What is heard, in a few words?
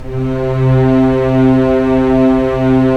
STR, Roland LA Composer